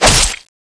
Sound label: knife stab